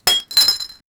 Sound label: a glass vase breaking